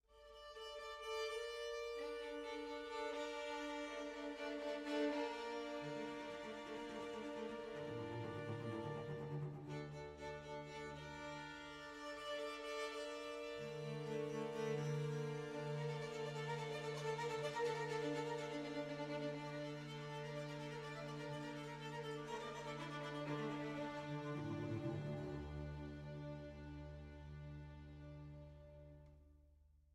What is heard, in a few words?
viola damore; 7 sins of viola damore; 5.1